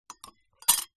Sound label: glass wine broken shard place into glass; household